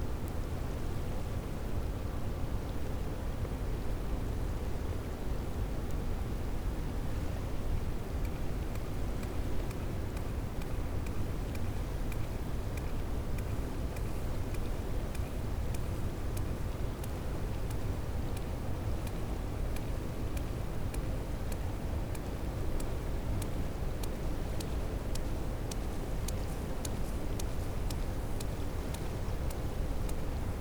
cachalot positif, Physeter Macrocephalus

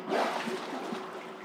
souffle res, globi

